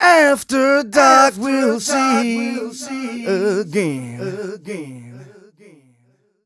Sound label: 130 mel g, Vocals